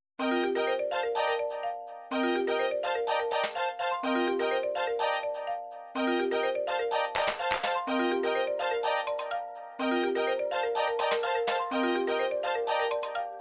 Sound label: staccato, midi; song book